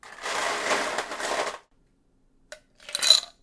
ice into glass; eating

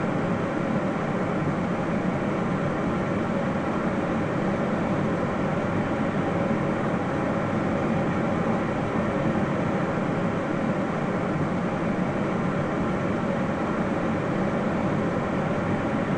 factory outside

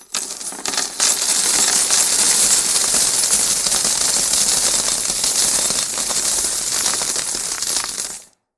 many coins; jidaigeki

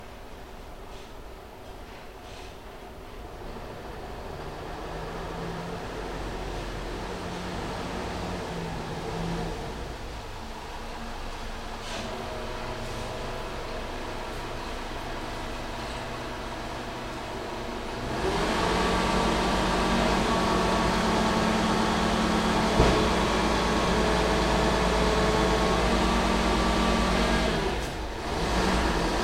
garbage truck

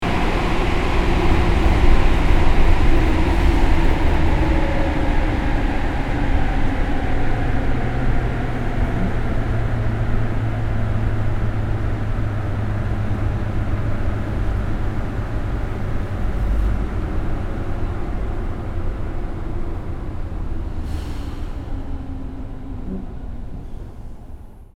subway slow down

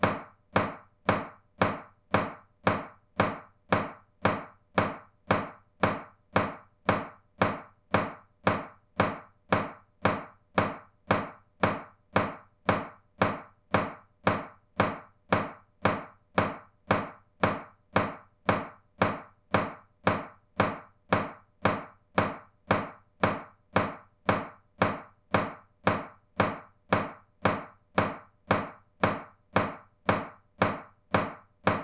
leaking shower, シャワー水漏れ; シャワー水漏れ３; wetarea

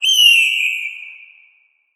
buzzard; animals

